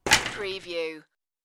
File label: Ping Pong Raquet